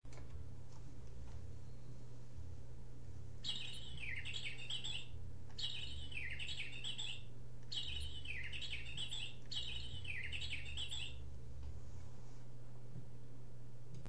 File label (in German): Zwitschern; Klugis